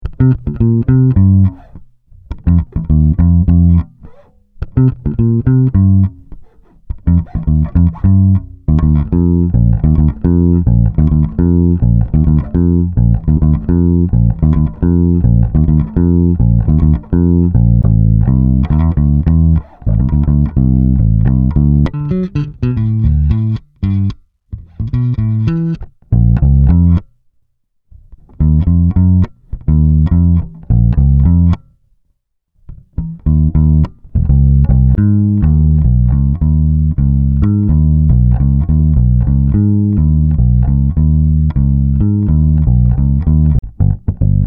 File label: Afro Beat, bass loops